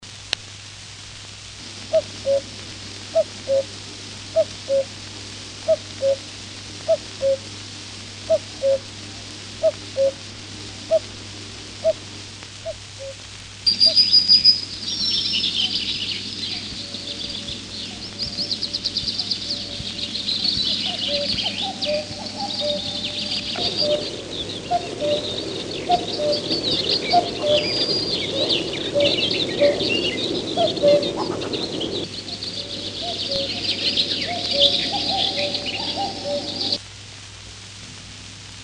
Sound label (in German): Kuckuck; Vogel